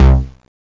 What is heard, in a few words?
sq-sound, keyboards; Zoom - Release 2; Active Software